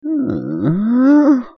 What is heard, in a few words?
eughhhagh